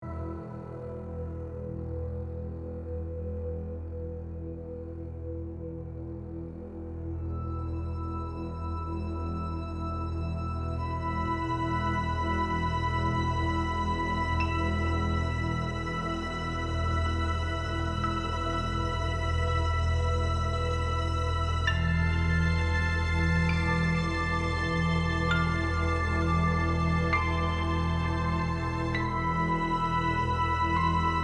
Ominous (Loop); The Walking Dead: The Telltale Series

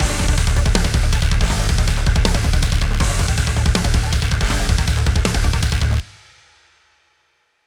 Loop 160bpm

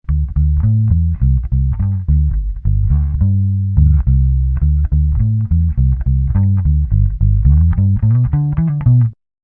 Bass; Bass Cat; Mellow Island

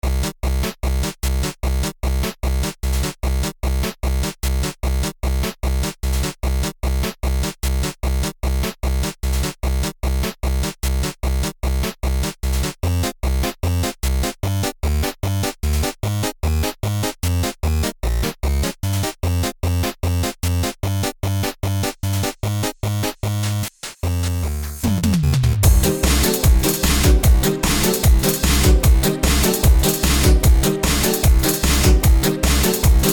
Instrumental; Friday Night Funkin' Skeleton Bros

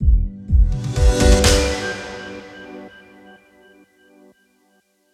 Goal Intro 125bpm 4beats; Rhythm Sprout; deleted soundtrack

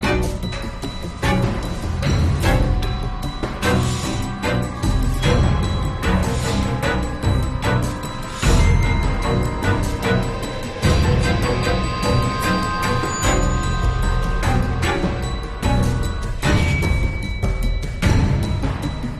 Action (Loop); The Walking Dead: The Telltale Series

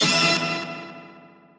Stage Stinger; Inkopolis News; Splatfest

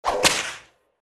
Beard Whip

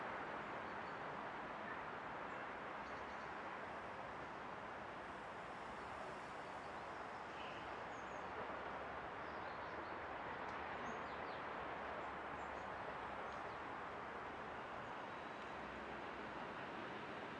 City Ambience (loop) (mono); Idle Mastermind; Android, iOS, mobile gamerip

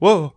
dad wobble; Ringling Bros. and Barnum & Bailey; Wii

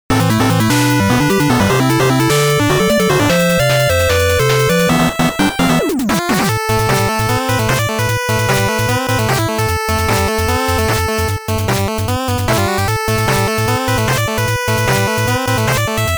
Non-NES style; Mega Man 9

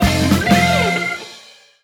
Jingle Promoted

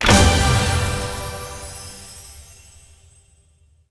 Music String; Blitz Brigade; Android

